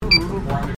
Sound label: beep